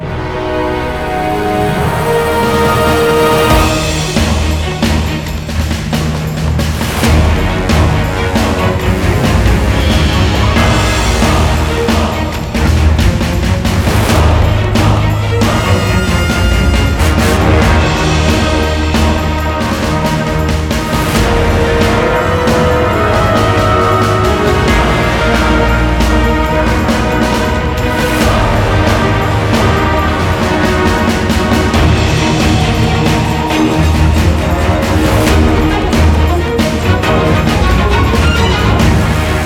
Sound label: Music Battle Theme Buildup; A Year of Rain